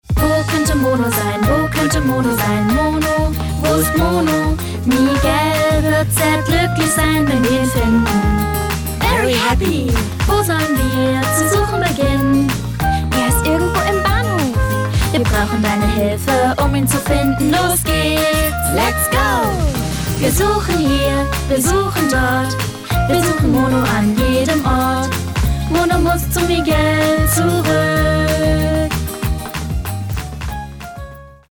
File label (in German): Gesang